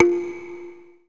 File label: kalimba